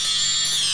chills

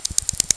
Gopher Sound; Koji the Frog 2.0.1

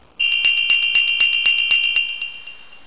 RING; Talking E-Mail

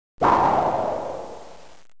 woosh

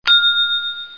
Ding; davidson

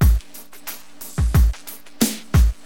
DM LOOP, Hip Hop, Drums; LiveAct Pool 1

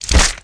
GUTS; Forsaken

